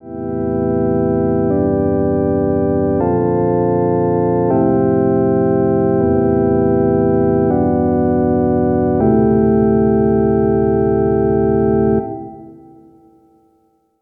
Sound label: Sonicware ELZ_1 FM Synth Gospel Organ